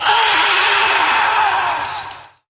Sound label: "ah" exhale